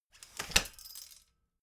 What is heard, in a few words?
folding chair fold fast